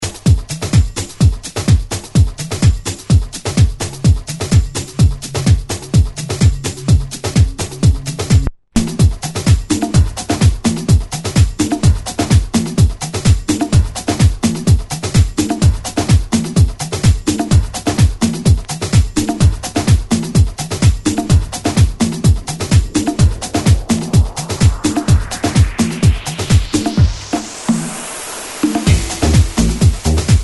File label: Tribal Groove